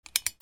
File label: CLICKS